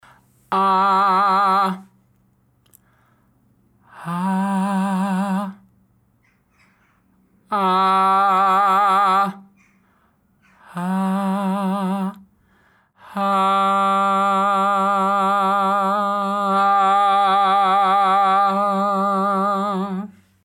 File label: AH vocal focus light airy